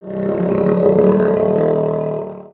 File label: Pet Crocodile Growl; creatures pets